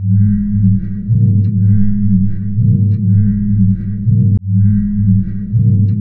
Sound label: Pure Tabla, 080BPM; Zero-G